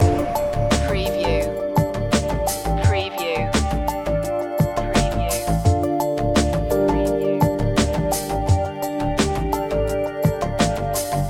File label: rising tech loop